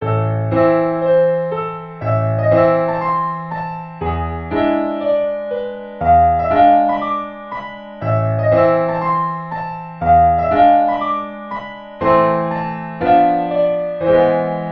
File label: Sentence Complete